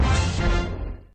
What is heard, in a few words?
total bo, jingle; total bonus; WILLIAMS; INDIANA JONES; Indiana-Jones-Original